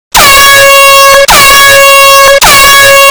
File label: airhorn